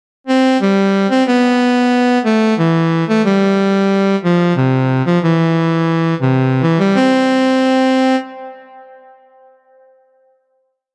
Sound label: Wood Piece